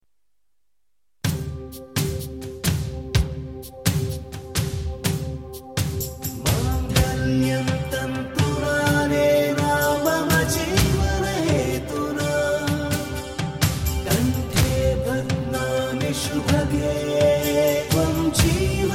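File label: Hindi song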